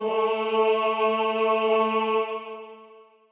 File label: choir